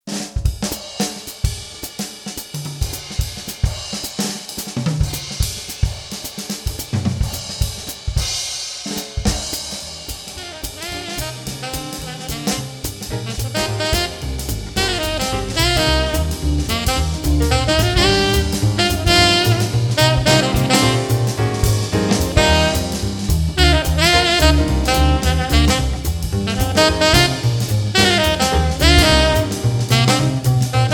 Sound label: jazz